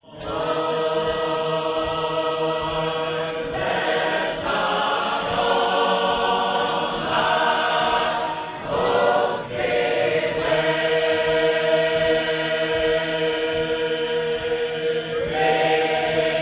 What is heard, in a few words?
harp